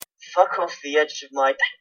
hiccup

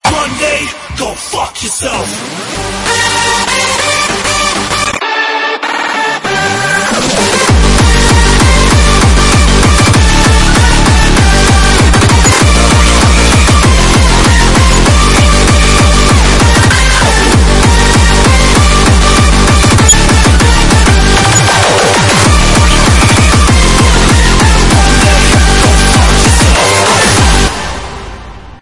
frenchcore

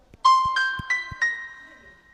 piano

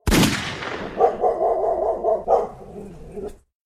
gunshot dog

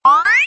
question mark sound; paper mario

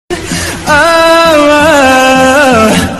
ohh woahh

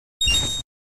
rupee sound effect; zelda

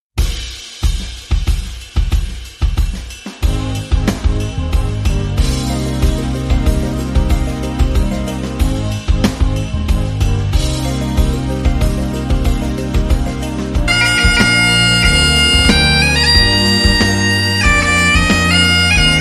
african pipe

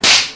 slap; pikachu

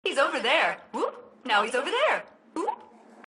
teleport; Cayde

